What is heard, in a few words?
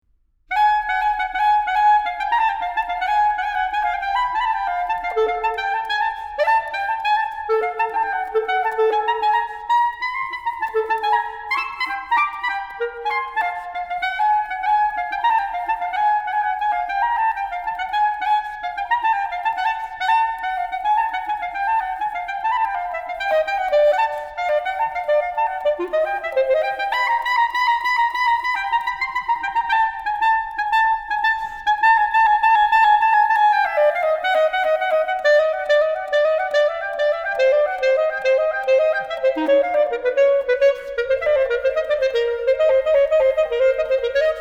Clarinet sample; soundcollage